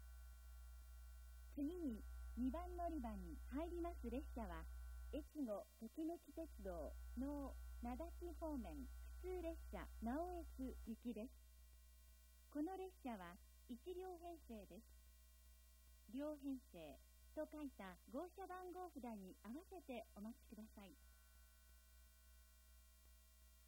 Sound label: local naoetsu, itoigawa station; echigo-tokimeki-railway